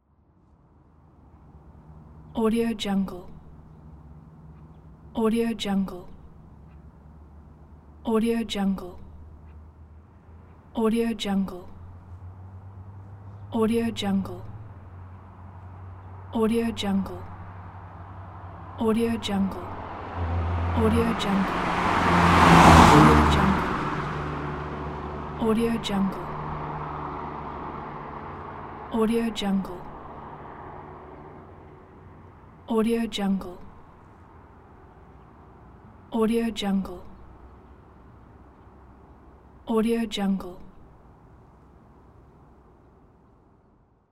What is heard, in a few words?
car passing